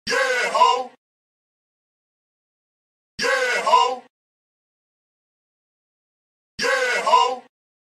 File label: lockchime